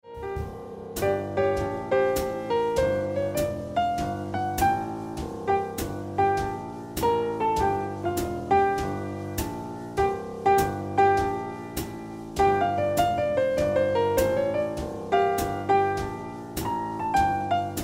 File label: Piano Music